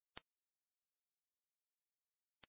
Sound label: silent slowed ringtone